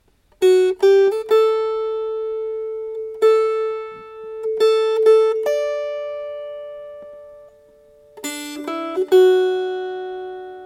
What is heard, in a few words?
Melody